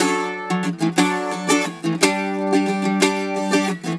Celtic Flavours; USB Soundscan vol.42; AKAI

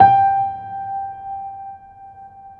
PED MF, GRAND PED; Best Service Advanced Media Trax Modern Composer

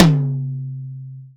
tom, velocity tom; VELOCITY TOM 1

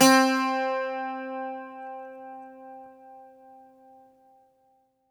Greek Bazouki, Plucked Strings

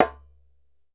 DJEMBE, African Drum

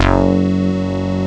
long bass; Amiga Soundtracker Sample Packs

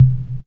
jam synth, E keys

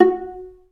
Vla Piz F#3; Digital Sound Factory Virtuoso

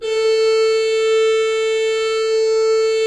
HGRP A3, Cathedral Organ, HOLZGEDACKT; Propeller Island

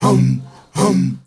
MALE HUM; M.HUMMERS; Spectrasonics; Heart of Africa

